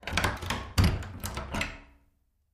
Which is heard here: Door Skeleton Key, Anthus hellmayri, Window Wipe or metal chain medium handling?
Door Skeleton Key